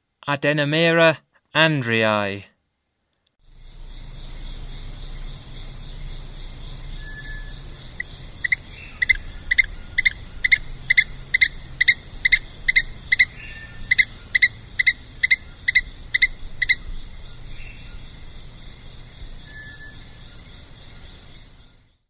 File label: Adenomera andreae, Frogs of Ecuadorian Amazon; S. America